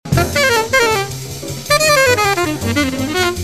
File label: line fast